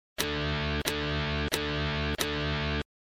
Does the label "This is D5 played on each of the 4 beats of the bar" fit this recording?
Yes